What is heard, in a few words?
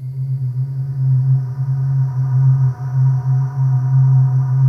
HOLLOW BLOW; Pro-Rec - Synthsonic